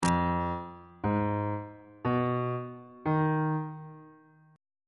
g sharp melodic minor 7th chord 6 is e sharp half diminished 7th on bass clef